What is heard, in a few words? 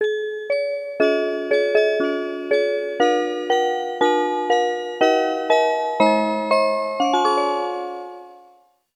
In Car Music